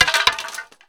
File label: Staff Underlay Death